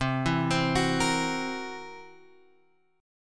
C 7th Flat 5th, Guitar, Open G

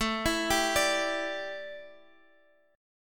D Suspended 2nd Suspended 4th A

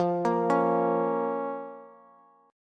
F Suspended 4th Sharp 5th F, Bass

